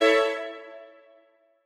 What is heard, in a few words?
Strings